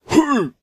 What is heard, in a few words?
npc attack; mobs; mobs redo